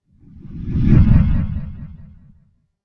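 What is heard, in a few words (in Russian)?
kometa proletaet moshchnyy svist; kosmos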